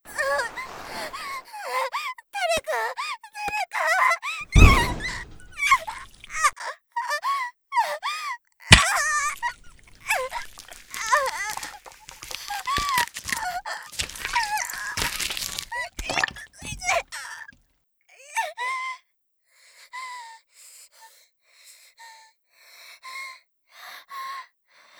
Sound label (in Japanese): 逝く; 効果音あり; 単発 〆系